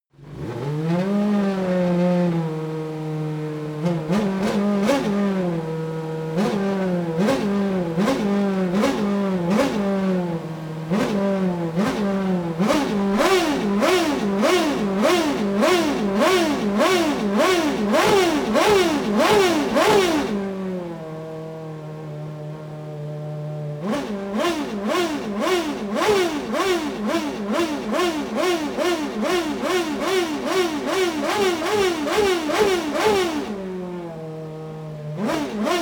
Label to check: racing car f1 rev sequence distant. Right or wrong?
Right